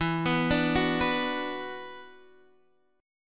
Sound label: E 7th Suspended 2nd E down Guitar Half Step Down; chord